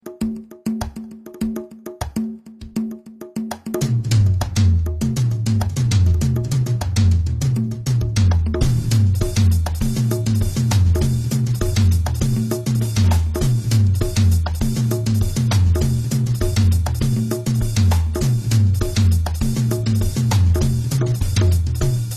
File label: tribal mix